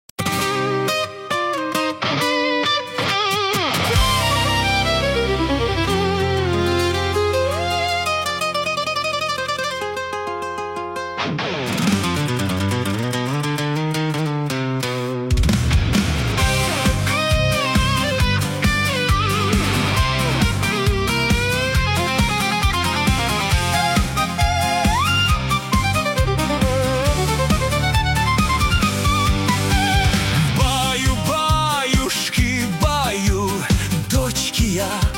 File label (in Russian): kolybelnaya